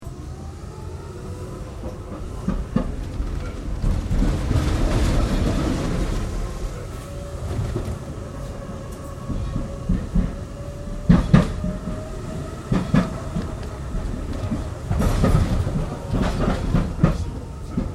Tram Melbourne Z3 Class Interior Track Noise Start Stop; Melbourne Trams; Streetcars; Vehicles